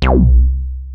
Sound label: OB C2, Rare Synthesizer; Hollywood Edge - Giorgio Moroder Rare Synthesizer Collection; OB 4 VOX